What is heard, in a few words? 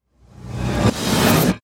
Dimension Portal, Suction, Scifi; Science, Fiction